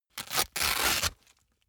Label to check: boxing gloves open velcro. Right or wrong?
Right